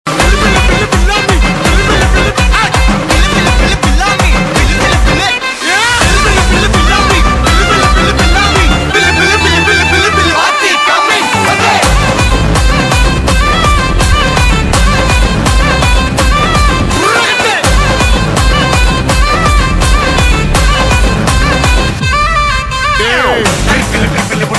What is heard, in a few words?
tamil ringtone